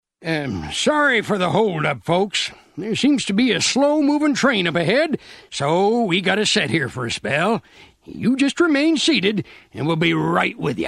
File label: big thunder breakdown